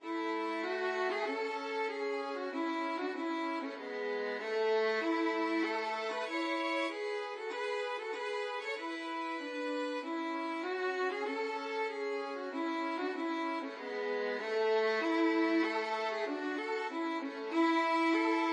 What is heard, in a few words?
Hail to the Chief, violin duet